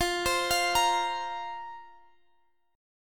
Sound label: A sharp Suspended 2nd F